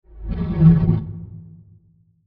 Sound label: Creature Scratch Transiton; Scary Soundscape; Horror; Crafted Signals